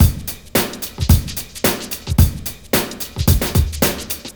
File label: electro loop 110bpm; dr.dezim